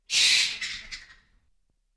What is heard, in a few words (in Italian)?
pipistrello; Inquisitor 3